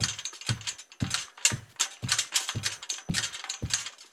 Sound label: Roll Library Cart Wheel Loop; SFX Kit; Foley - Misc